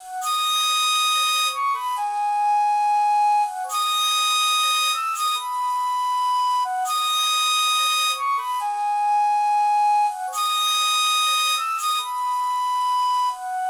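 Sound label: Indian Flute 140 bpm Ab minor; New Nation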